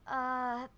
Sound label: Uhhh; Alice